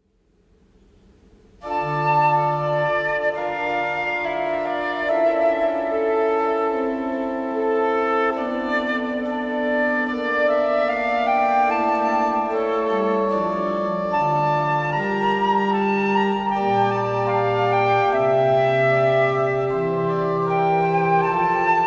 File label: Flute sonata; Marcello